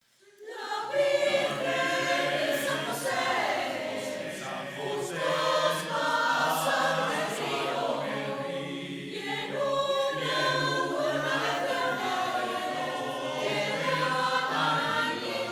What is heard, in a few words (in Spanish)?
La Virgen y San José, Coro Polifónico; villancicos